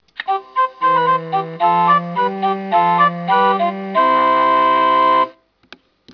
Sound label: organ